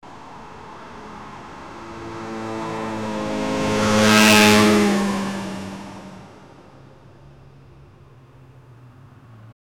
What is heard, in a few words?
a large pickup truck driv; offroad